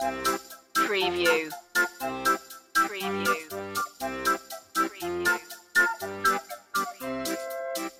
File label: merry polka loop